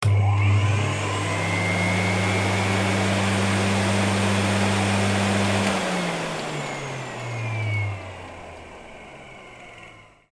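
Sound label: cleaner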